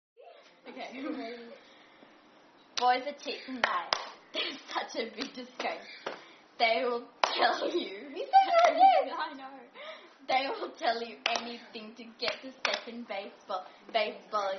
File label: Clapping, Boys Are Cheats And Liars They Are A Big Disgrace